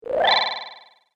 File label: Magic Power Up; Magic Sound Effects